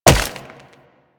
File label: Wall Jump Ice; Footsteps; Warframe; Tenno; Wall Run